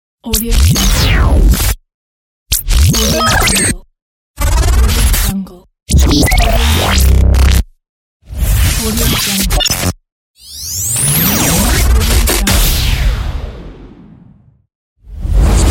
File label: Radio Imaging SFX Pack; DASPro Studio